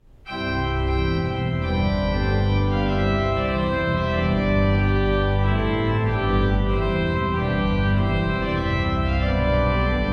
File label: Trumpet Tune; Purcell